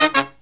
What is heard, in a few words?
LOW HIT, Brass